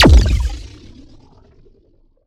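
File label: Sci weapon Shot Medium Blaster Pounder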